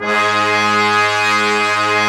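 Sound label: Brass Ensemble mute; Roland LCDP06 Brass Sections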